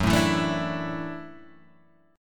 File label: F sharp Minor 7th Sharp 5th, Guitar Open G; chordshape; down